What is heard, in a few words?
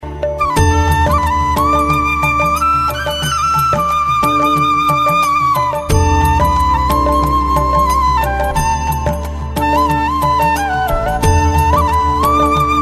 music